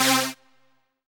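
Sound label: Rave Synth, Dance Mega Jungle Rave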